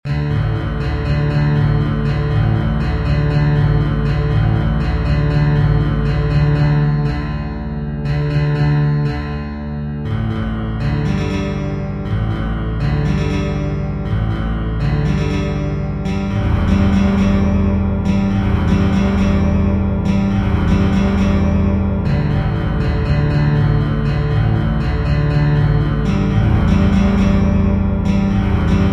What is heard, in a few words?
Horror music